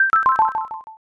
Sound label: score down